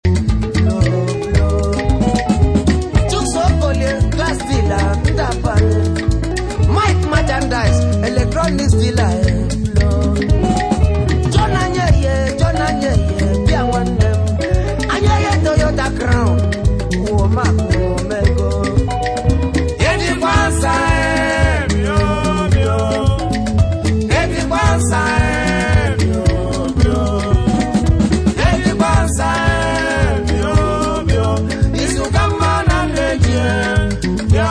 Guitar Band; Lagos

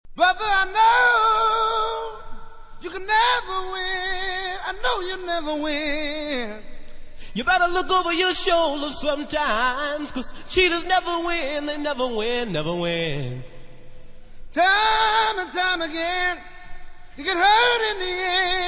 acappella

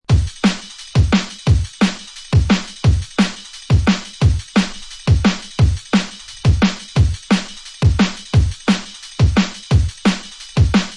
Dreadz Kik An Roll, beats; ray keith dread jungle breaks vol 1 60 beats for dubplate clashes battle junkies

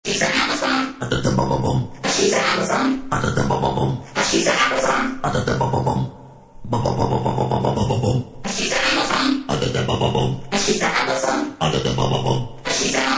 acappella

